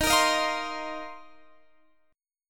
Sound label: Bb Suspended 2nd Suspended 4th, chord